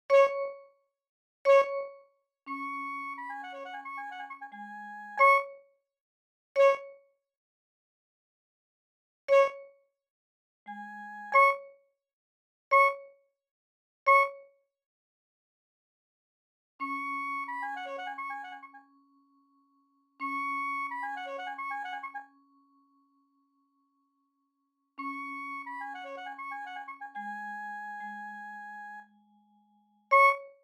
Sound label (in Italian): Duo Per Saxofono Soprano E Vibrafono MIDI; Boselli G